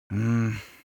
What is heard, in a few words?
human vocal male sigh